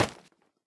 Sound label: book drop; Escape from Tarkov